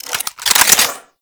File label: Reload Clip In; Warframe; Weapons; Tenno